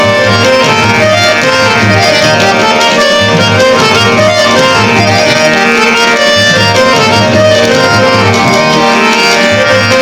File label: harmonium